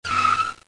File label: notification